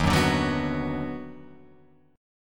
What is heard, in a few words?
Eb Minor Major 7th Sharp 5th Eb down Guitar Open F, chord; EbmM7#5; strummed; JGuitar